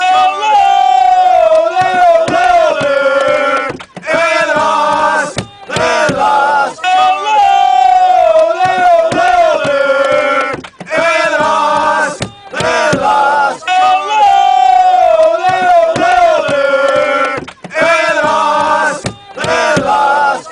chants; south melbourne hellas

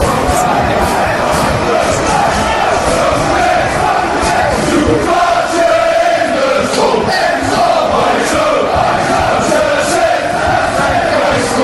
hand in hand fanchants

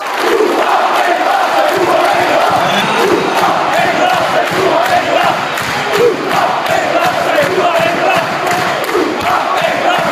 ooh aah, chants; engelaar

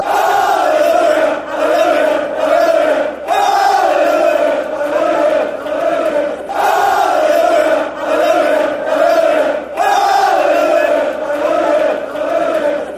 hallelujah hallelujah hallelujah fanchants

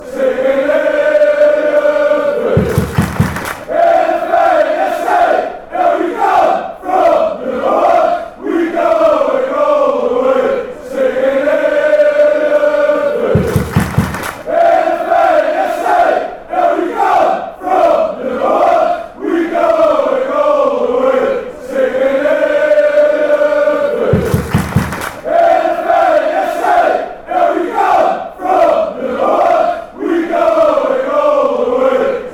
singing, chants; heerenveen

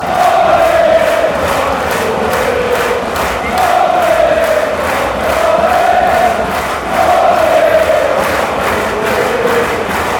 ole, chants; gladbach

